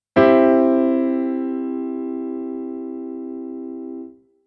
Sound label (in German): temperiert c dur